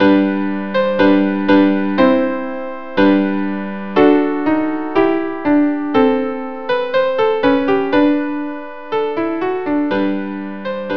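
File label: violin viola duo; St Anthony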